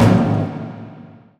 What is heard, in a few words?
Percussive Stab